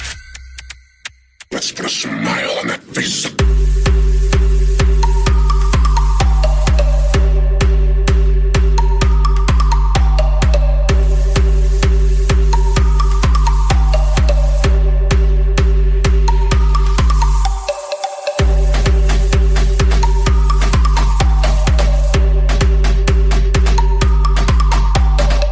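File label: popping trance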